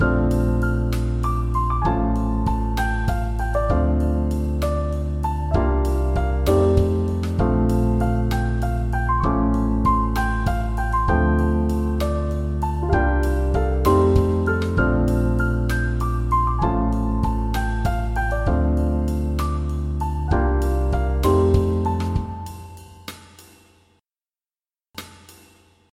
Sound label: piano